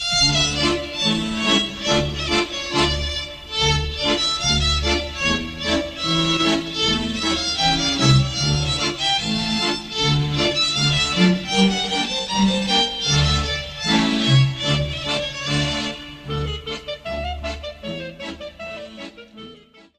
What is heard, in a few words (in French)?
Walczyk Lubelski, Pologne